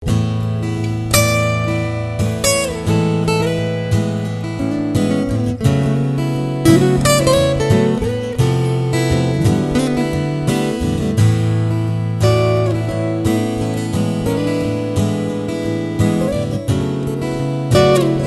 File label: Acoustic Guitar